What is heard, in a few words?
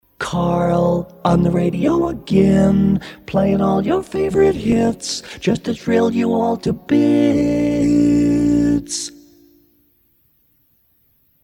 Jingle; swansea sound; Kenny Everett